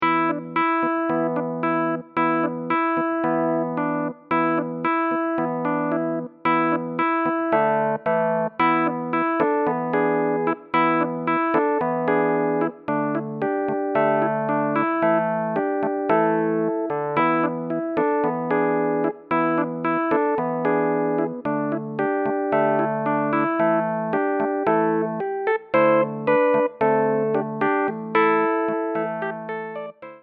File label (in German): Rock oder Hose; GPP vol.02